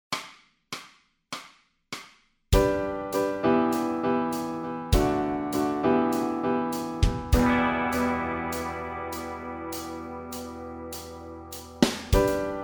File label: bass scales, backing tracks